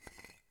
Rocks

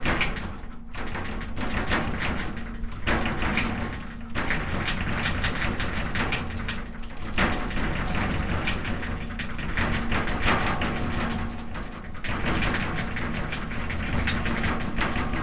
Bang

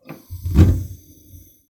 Fire Ignite

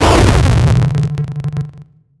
8 Bit Explosion Low Resonant

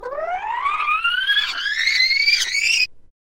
Screeching Tape Rewind

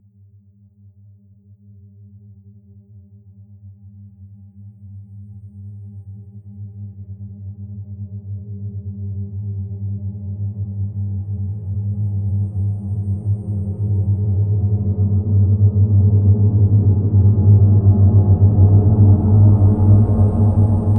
Alien Space Sound

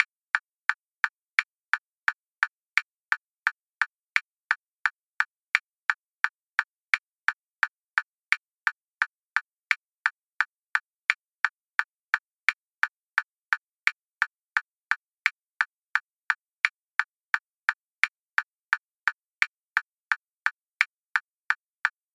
Metronome

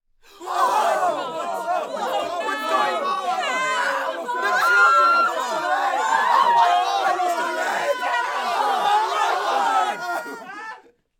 Crowd Scared Swell

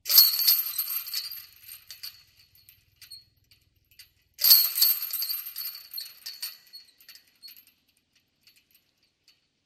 Rattling Chains

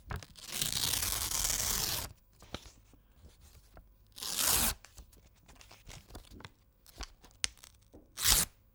Paper Rip Variations

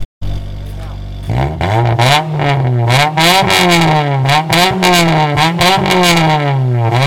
Car Engine Revving

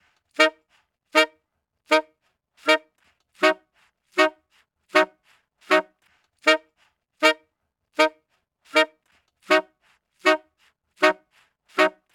158 D Sax; Zulu

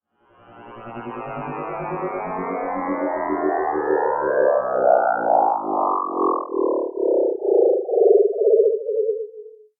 Space Vehicle Arrival

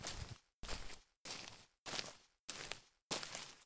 Forest Footsteps

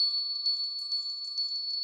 Natural Clochette

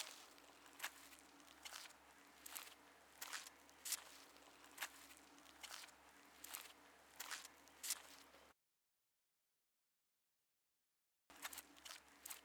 Footsteps On Sand

